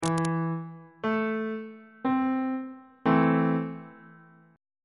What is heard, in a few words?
g double sharp minor 2nd inversion on bass clef